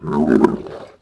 Kent Call, Dino

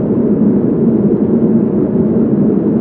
Oilrig Ambience, ambient; Totaled; XBOX

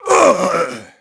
DEATH, VOICE; Pulse Racer

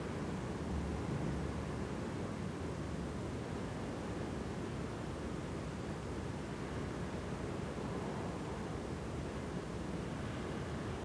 Tatooine wind, ambience; Star Wars Obi Wan; XBOX

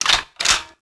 chamber Sniper; weapons; Max Payne

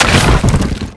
break wall

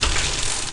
bmx peddle; terrains; Tony Hawk's Project 8; XBOX

